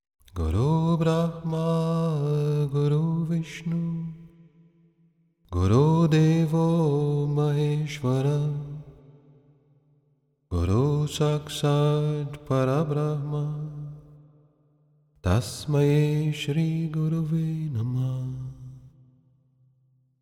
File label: guru bhajan